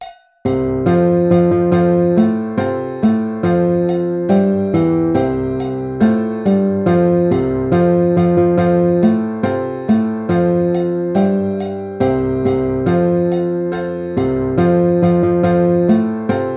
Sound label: Guitar